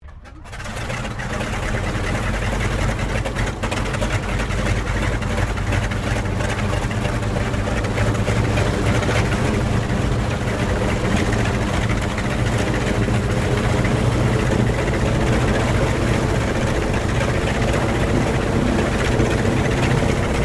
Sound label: AIRCRAFT T-28 TROJAN STARTUP IDLE; Trojan fighter bomber; Airplane Sound Effects; Airplanes (Sound Effects:Vehicles)